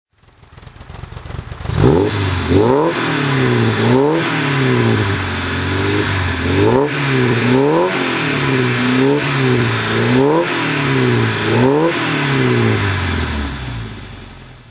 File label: volvo p1800s throttle